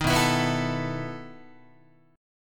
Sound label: B 11th Guitar; strummed; JGuitar